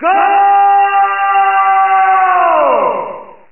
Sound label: GOAL; CD Action